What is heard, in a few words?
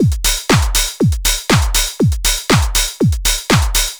4 floor beat, trance